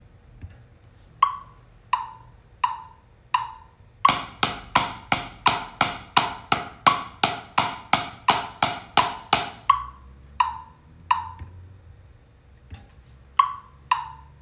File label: paradiddles, drums